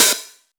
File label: Open Hats, Cymbals